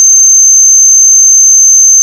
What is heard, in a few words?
Theremin Vintage; Atmospheres; Ableton Foundation FreePacks